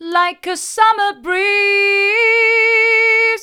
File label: like a summer breeze hard, vocal